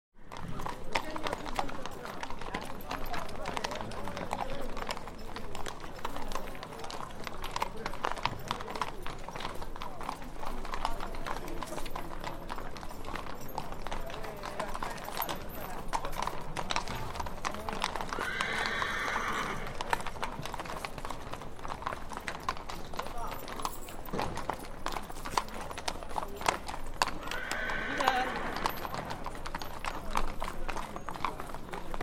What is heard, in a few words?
City Street Hooves And Wal; Hollywood Edge Historical Series; Traffic Free BG's & FX